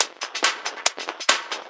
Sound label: Loops 140 BPM Hihat Percussion Loops